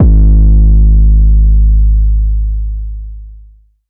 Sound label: 808 - G#; 808s; Cymatics; Cobra Hip Hop Sample Pack